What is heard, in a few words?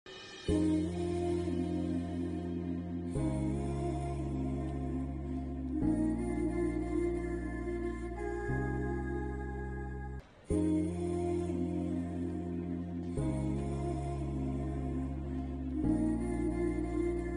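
love background music; shyam singha roy; krithi shetty; nani; sai pallavi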